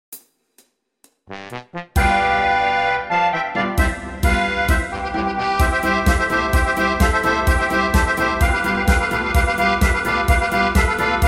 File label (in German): Trompeten Echo